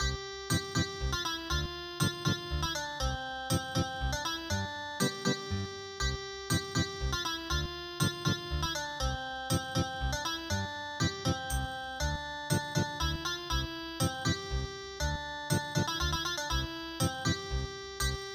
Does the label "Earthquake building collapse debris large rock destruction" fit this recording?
No